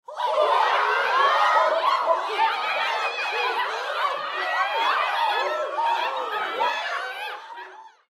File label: Human Crowd Studio; Crowd Reactions Kung Fu Battle; Blastwave